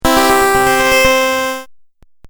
Game Music Phrase, Video Game Sound Effects

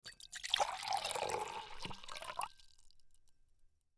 Glass Cup Fill, Glass Cup Fill With Water; Dishware; Household

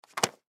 Paper Stack Drop Cement; Foley; Materials; Blastwave

